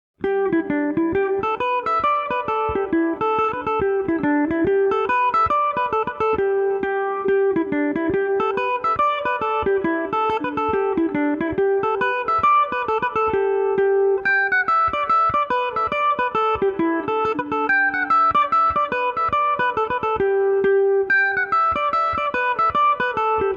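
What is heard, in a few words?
G major, Irish mandolin; The Whole Chicken In The Soup; Revelation Jaguar electric mandolin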